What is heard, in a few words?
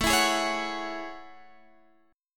A Major 13th